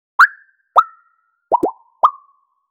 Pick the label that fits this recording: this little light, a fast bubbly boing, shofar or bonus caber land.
a fast bubbly boing